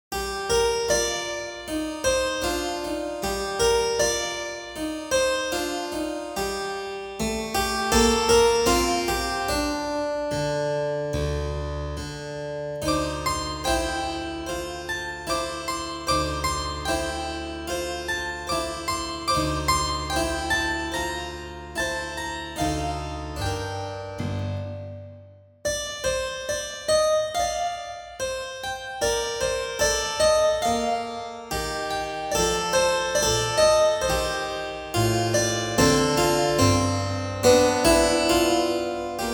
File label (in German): cembalo; ravel mamere